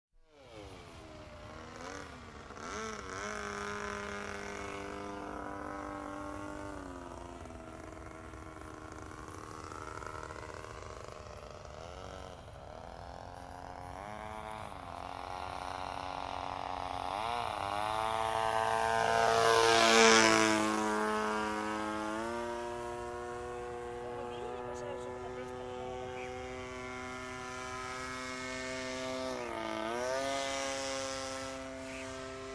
Model Vliegtuigje; verkeer algemeen; KMP Film Video Sound Effects

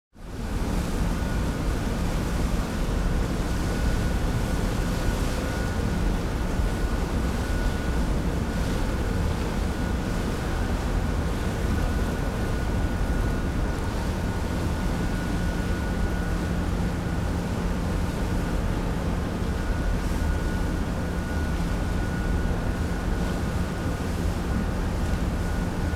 Boot Vaart op open zee; KMP Film Video Sound Effects

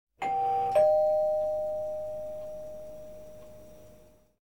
Deurbel; KMP Film Video Sound Effects

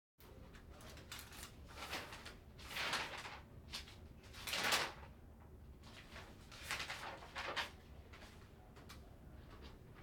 Voorbereiding Injecties; KMP Film Video Sound Effects

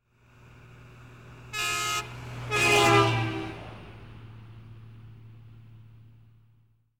Vrachtwagen Passeert claxonerend; KMP Film Video Sound Effects

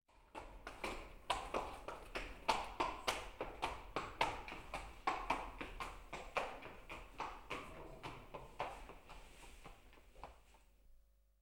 Paard Loopt weg in stal; KMP Film Video Sound Effects

